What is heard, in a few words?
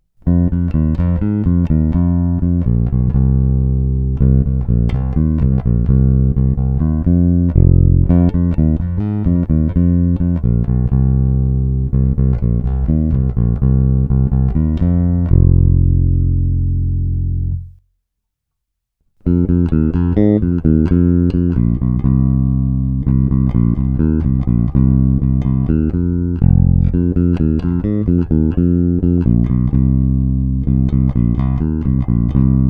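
Jazz Bass; Sadowsky NYC Ultra Vintage J Bass Guitar; Snímač u kobylky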